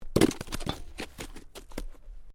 Body Movement Snow Drop; Body movement foley to simulate falls and deaths; Drops; Objects

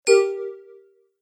Magical Message Notification

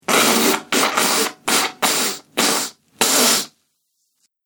Blowing Snotty Nose Into Tissue; Sniffling Sound Effects

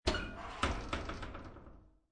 Household, Dryer Door Open, Squeaky Door; Laundry

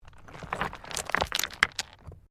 STONES MOVE; Material components for constructing more complex sounds

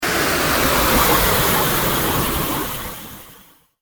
Alien Rocket Landing; Alien Sound Effects; science fiction sound effect; Andrew Fleming

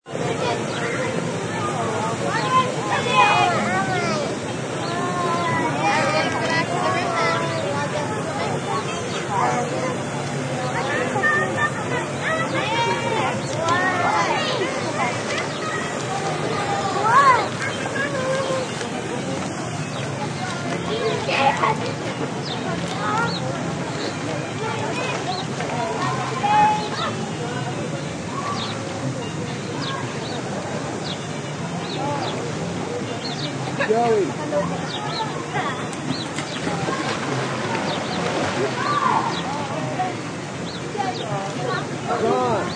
Sound of crowds and water poolside.; Poolside Ambience

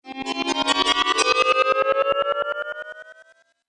Arcade Game Magic Sound; Video Game Sound Effects; Retro Inspired Sound Effects - Think Nintendo, Atari, C64

